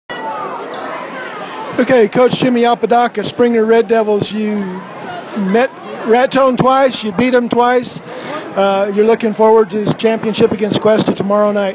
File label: Cowbell; Springer Red Devils